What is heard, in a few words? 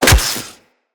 Melee Staff Impact Robotic Flesh